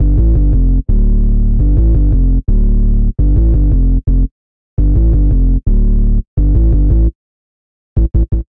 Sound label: Bass D Minor 113 bpm; Bassline; Tonal; MIDI Clips; Drive and Glow; Ableton; Factory Packs